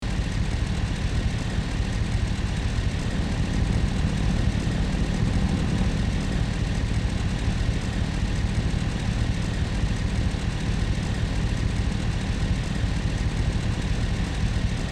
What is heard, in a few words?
SUV engine idling loopable